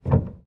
piano release; samples-piano

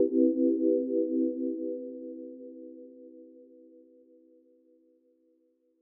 rhodes

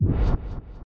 teleport; locomotion

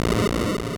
Line Clear

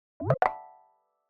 code Action Triggered; accessibility Signal

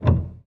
piano release; samples-piano

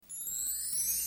end card

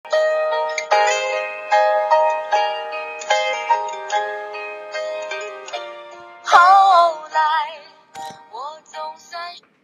music

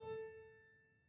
piano harmonics A4, Grand Piano

